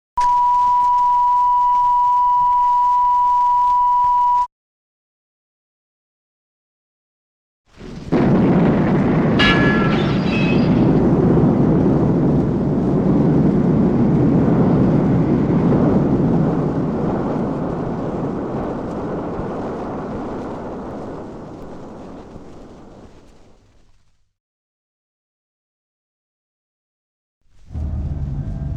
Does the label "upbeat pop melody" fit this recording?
No